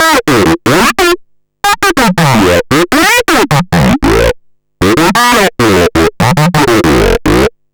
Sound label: Processed Vocals, Loops